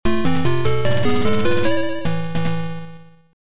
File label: Opening fanfare, Level Complete; World of Spells